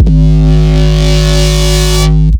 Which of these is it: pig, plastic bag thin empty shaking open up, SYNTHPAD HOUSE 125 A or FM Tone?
FM Tone